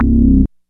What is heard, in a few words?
REZ BASS, OBERHEIM; Rubber Chicken Software Sample Bank